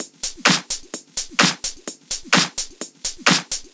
Drumloops 128BPM

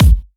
Hard Kick; Vengeance Samples

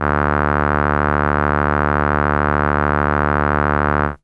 Horn D2, ARP Solina, Unmodulated; musicradar-synth-samples

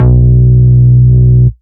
Root B, Ultimate Bass; Vengeance Samples

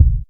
Sub Perc, Bassdrums; Vengeance Samples

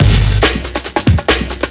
Breakbeat; Vengeance Samples; VEC2 Loops

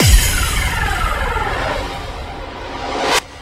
Loops Fills; Vengeance Samples

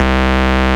Basses C; Vengeance Samples

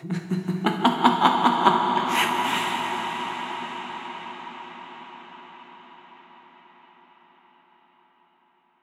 Reverb Athmospheres, FX Vocals; Vengeance Samples

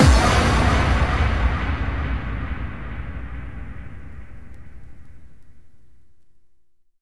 bassdrum reverb FX kick; Vengeance Samples

